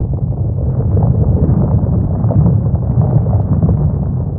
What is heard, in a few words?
Asteroid loop